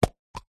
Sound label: household shaving foam can plastic lid pop off